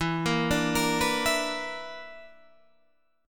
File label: B Minor Major 7th Double Flat 5th, chord